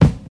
clear bass drum single shot G sharp key, steel kick drum sound tuned to the G# note